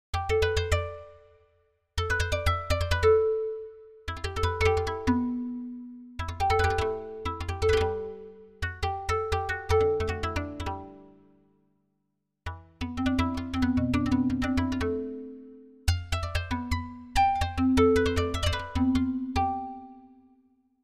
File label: Eastern string